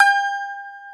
Baglama G4; Planet Earth; Digital Sound Factory Planet Earth

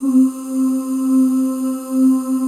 C3 FEM OOS

